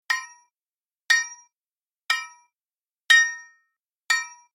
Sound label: Impact Sword Hit; SoundSeed-Generated